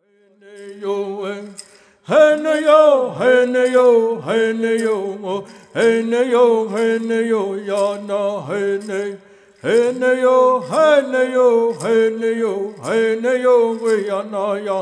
Apache Peyote Song

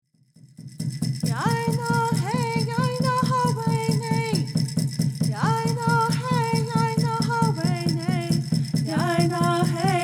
Straight Song

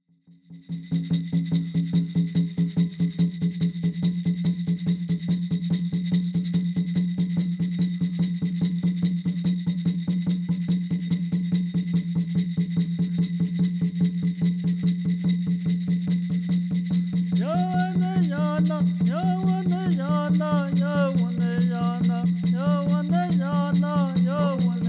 Peyote Song

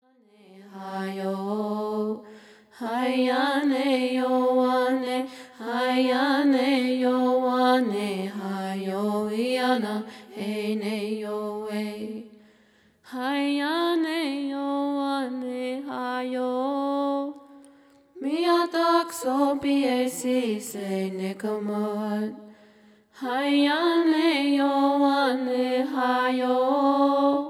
Peyote Song

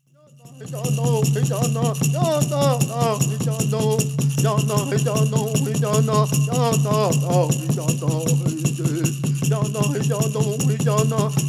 Peyote Song

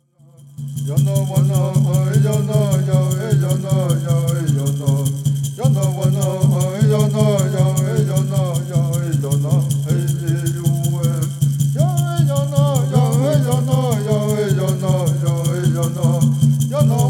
Native American Church Song

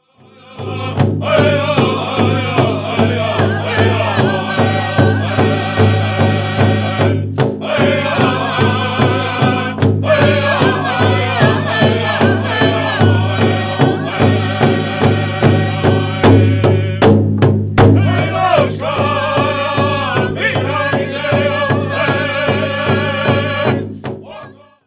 Southern Straight Dance

